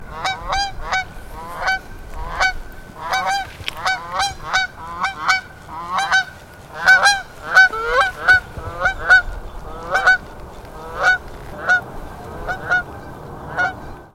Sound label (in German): kanadagans